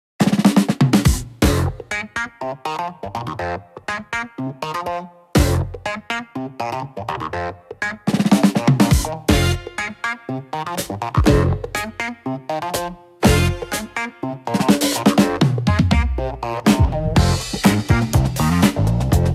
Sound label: Skyline Siren Beat (122); godot